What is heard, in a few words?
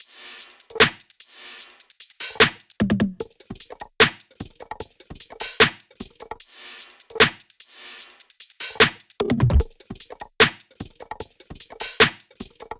loop top 150; kleopatra; Seismic Loops; SEISMIC2